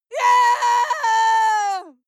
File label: female lunatic scream attack long hard; menschen; schreie